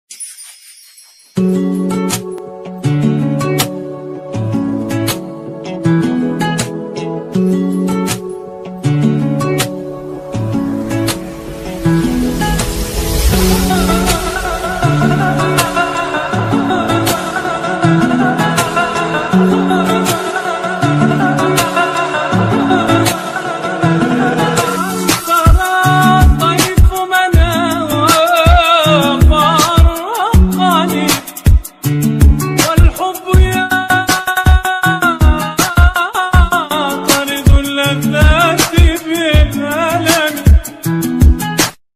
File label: bass boosted remix; action bgm